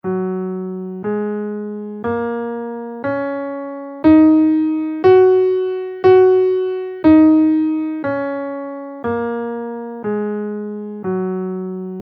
major pentatonic scale in Gb, guitar

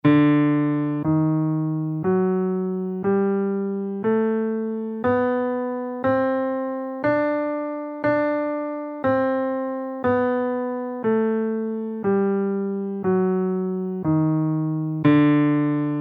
mixolydian b2 scale in D

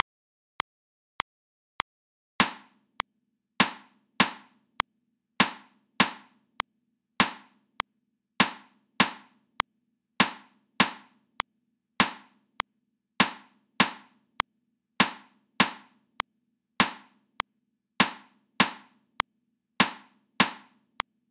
rhythms at 100 bpm

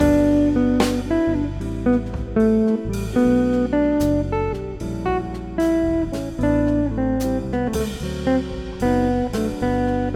claves on a jazz tune

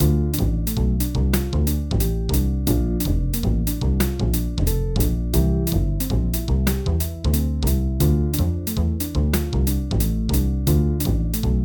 3 vs. 2 backing

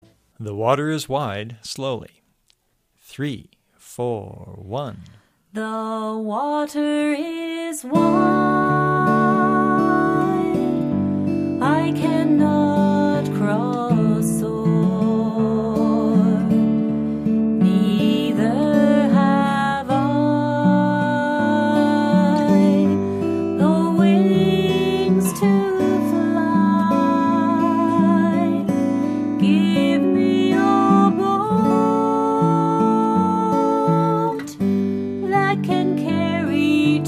travis picking

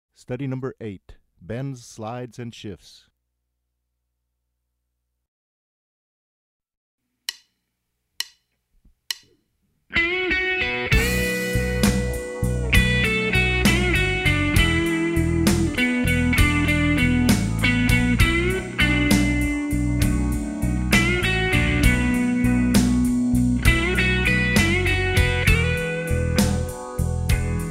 Bends Slides and Shifts, guitar

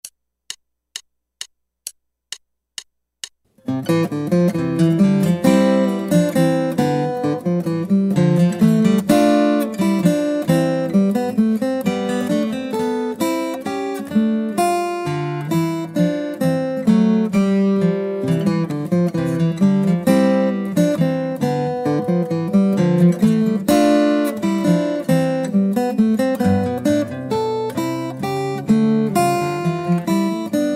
Guitar

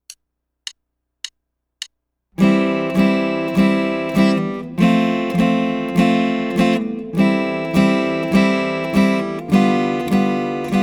Four String Chord Study

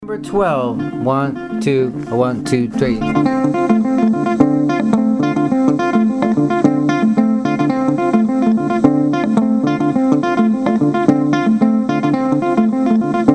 mozambique patterns

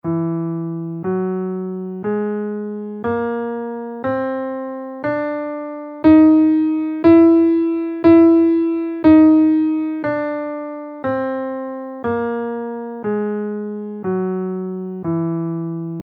leading whole tone scale in E, guitar

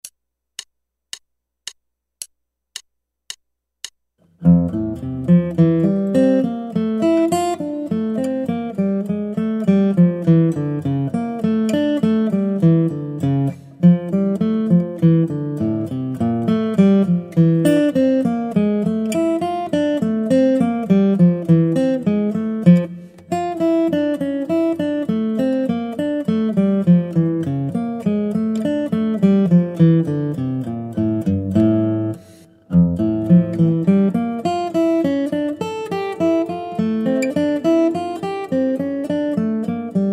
Guitar

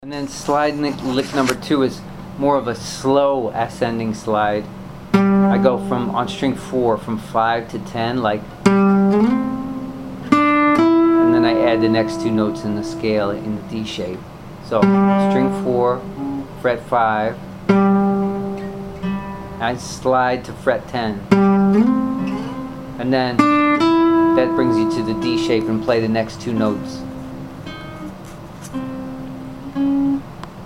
licks using slides

